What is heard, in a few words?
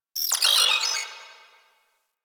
Tech Data Beep; Warframe; Ambience; Large Ramp Hall